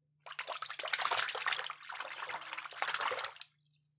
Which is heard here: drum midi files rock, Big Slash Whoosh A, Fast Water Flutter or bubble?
Fast Water Flutter